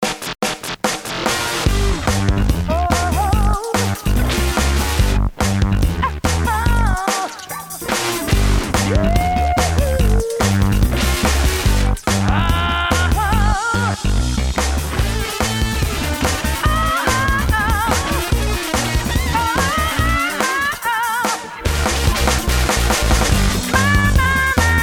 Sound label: Techno Vamp Voc no Hits